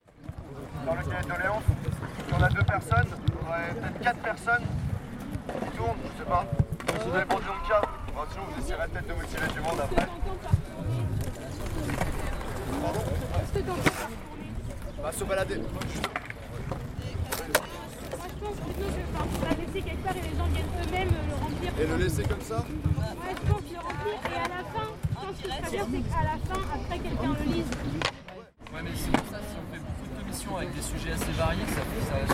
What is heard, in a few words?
ambiance